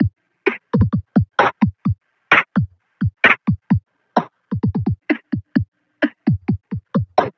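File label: Messup Loop, beats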